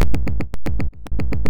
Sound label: beat